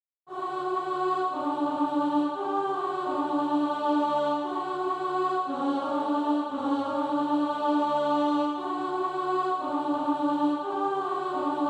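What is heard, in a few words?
alto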